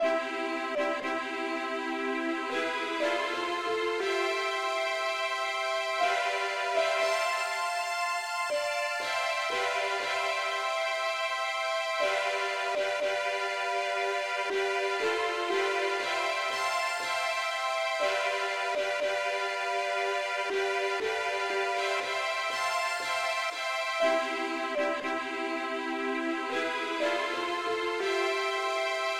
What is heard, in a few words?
uke lead key c; pilot